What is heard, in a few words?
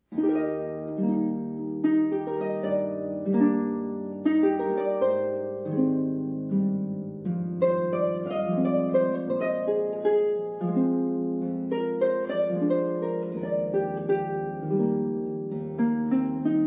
Waltz